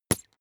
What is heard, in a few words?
bath duck hit